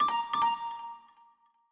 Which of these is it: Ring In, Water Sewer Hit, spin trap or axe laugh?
Ring In